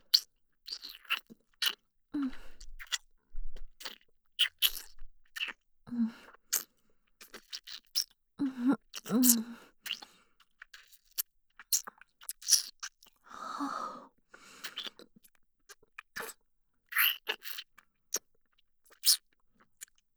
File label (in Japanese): フェラ音